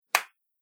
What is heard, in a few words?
Slap Smack Sound; Slap - Smack Sound 5; Slap Sound Effects; combat; Human Body Sound Effect; Andrew Fleming